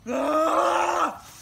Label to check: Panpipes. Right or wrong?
Wrong